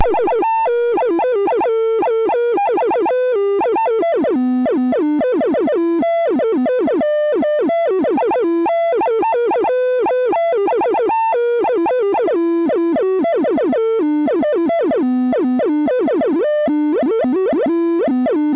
Blues, MOS6581R4; 128 Byte Blues BASIC

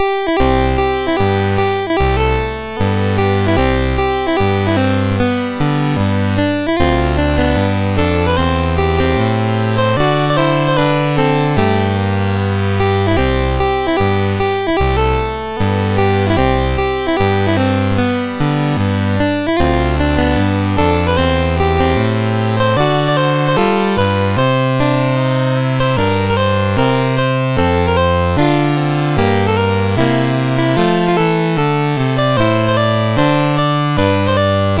mus MOS6581R3

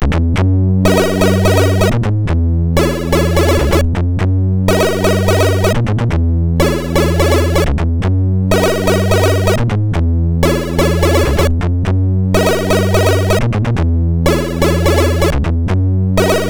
Simple Switchord, MOS6581R4

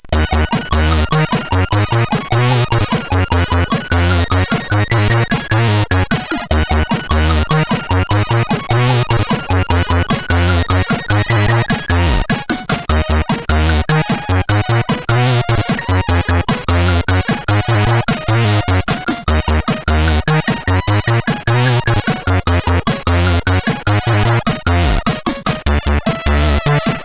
Revenge, CSG8580R5; hvsc

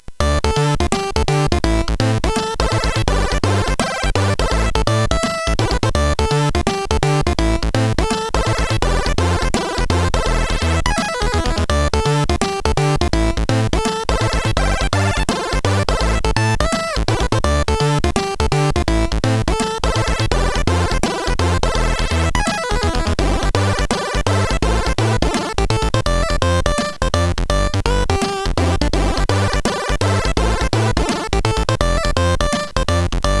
Su-Sweet, CSG8580R5; hvsc

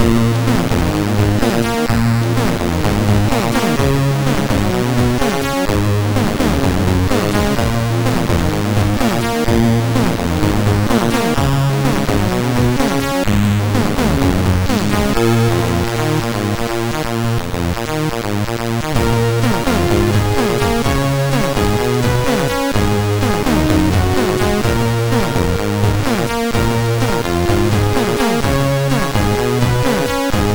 Heavy Guitar, MOS6581

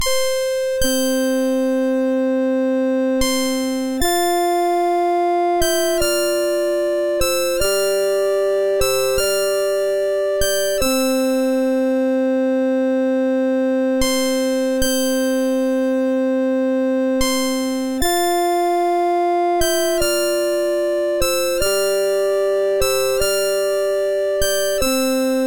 Lullabye, MOS6581R3

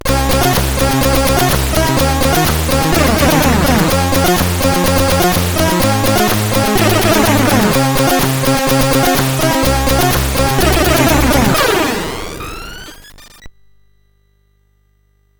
Game Music, sid CSG8580R5

